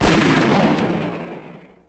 Revolver Shot; Conkers BFD

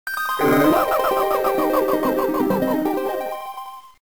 BOMB BOSS FALL; WarioWare, Inc.; Goma

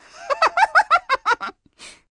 laugh; Supersponge; sandy

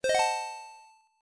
Systemsfx unused

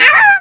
Damage Fly; Yoshi

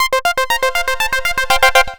Unused Jingle; DKR DS

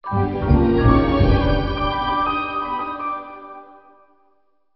Reward

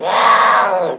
Bowser Final Roar

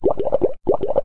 Wrong Music Pom Pom; SBCG Ep3

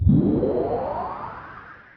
orb pickup; Predai; wraith

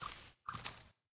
Dilo footsteps; JP Dinobattles

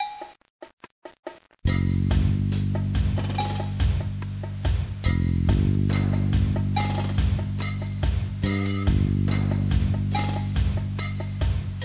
Unused Music; Putt Race

